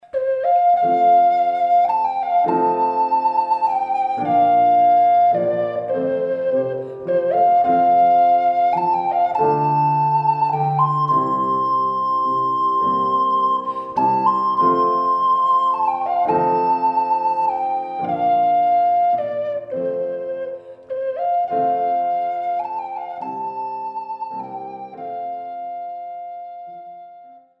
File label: Amazing Grace, ocarina